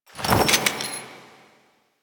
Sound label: Customization UI Sel Menu; Veilbreaker; Kahl